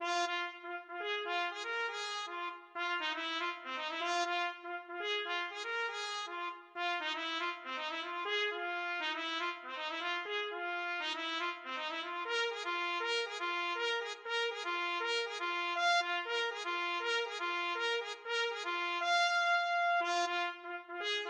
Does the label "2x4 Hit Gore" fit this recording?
No